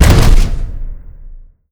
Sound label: dwarven centurion foot, walk